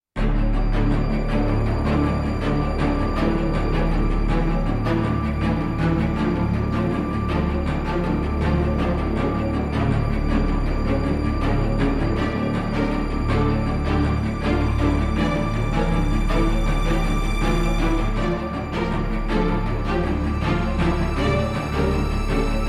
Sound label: No Choir